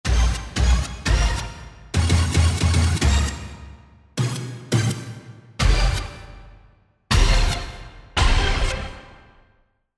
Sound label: Tekno Hit